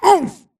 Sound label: grunt hits; Titanfall 2